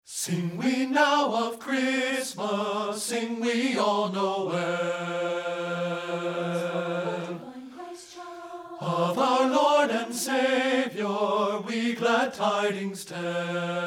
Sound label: SING WE NOW THAT HE IS BORN TEN; satb; partbypart